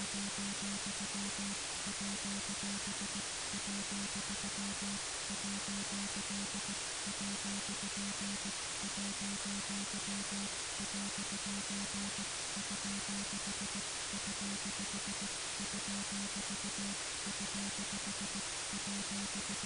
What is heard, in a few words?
signal; Space Heroes CTF; forensics